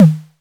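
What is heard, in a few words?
tom tight; Crypton - DrumBase5000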